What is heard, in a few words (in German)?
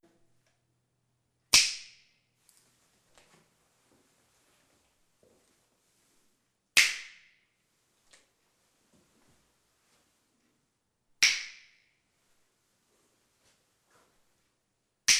Hallradius